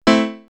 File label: House Piano Chord; X-Static Goldmine; backtothe70's